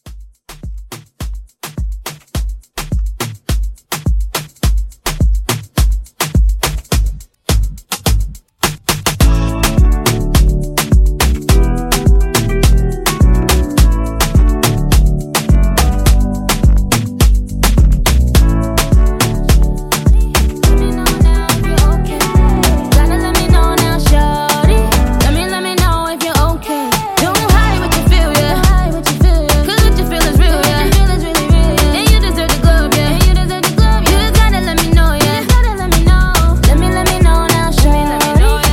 Moombah 105

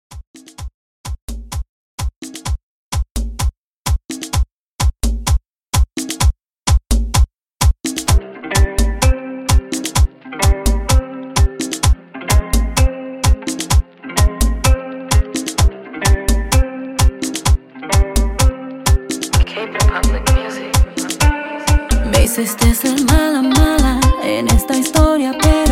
Dance Re Drum 128

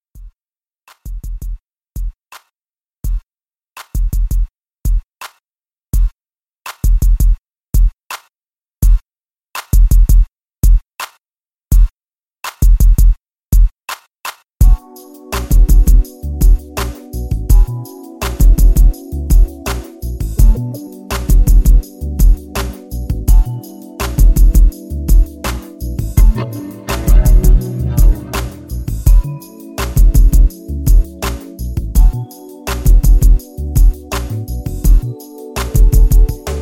Redrum; Remixfreakz

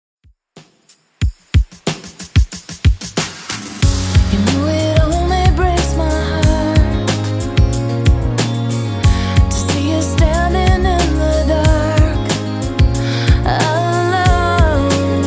90s Redrum 92 bpm

